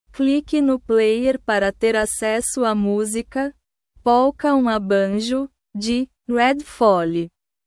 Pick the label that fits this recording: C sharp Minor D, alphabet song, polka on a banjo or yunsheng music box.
polka on a banjo